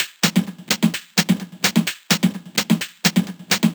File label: Drumloops 128BPM